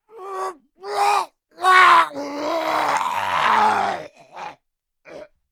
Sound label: npc male eaten; DeadFright